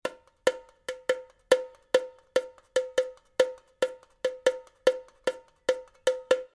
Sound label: TAMBORIM